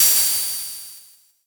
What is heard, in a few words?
cymbal